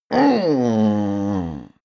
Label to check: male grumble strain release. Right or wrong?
Right